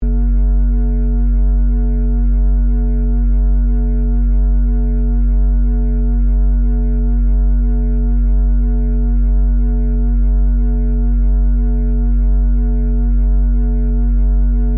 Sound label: Energy Field Loop, Science Fiction; Scifi, Energy, Field, Loop